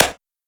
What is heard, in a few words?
perc; Anthemic.Electro; drum hits